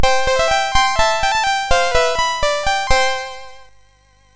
Clik Clak, MOS6581R3

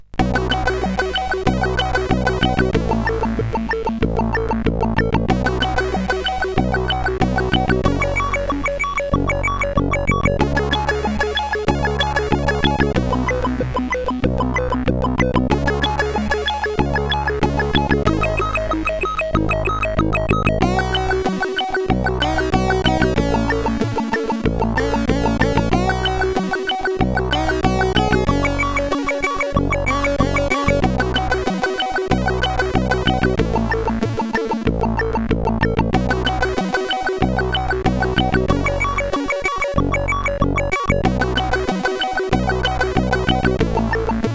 sid 8580R5; Abstrakcja; hvsc

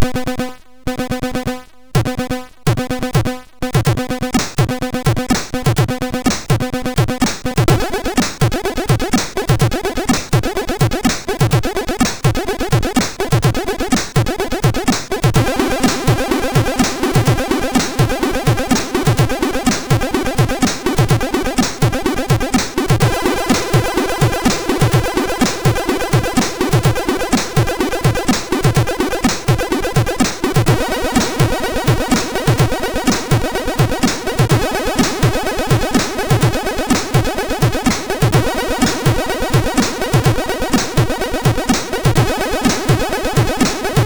Harmonious, sid MOS6581R2

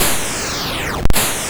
BASIC, MOS6581R2

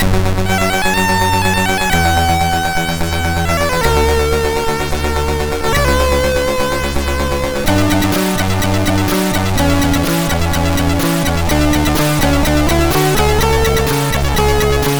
Game Music, sid MOS6581R2

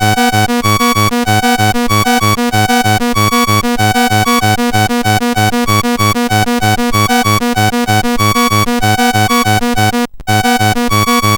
BASIC sid CSG8580R5

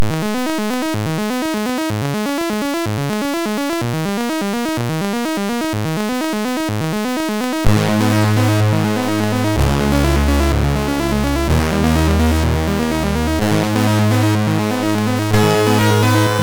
Break intro, MOS6581R3

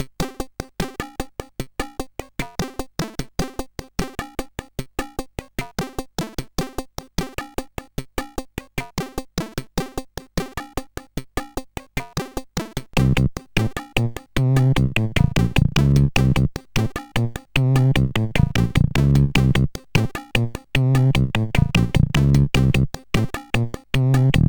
D50 tune, CSG8580; CSG8580R5

